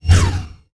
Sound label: fire; unreal; skaarj